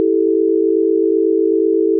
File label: chord